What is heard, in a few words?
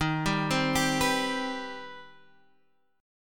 C Minor Major 7th D sharp